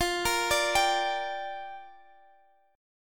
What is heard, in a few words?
Bb 6th F